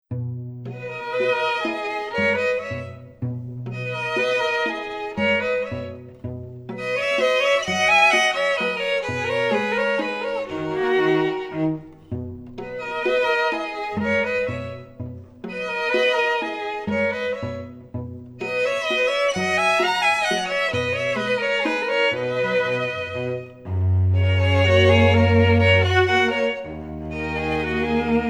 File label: FLOWER DUET, string quartet